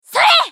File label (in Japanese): ファイター女 攻撃短